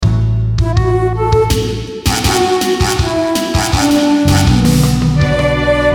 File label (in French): Guiro, Le guiro dans l’arrangement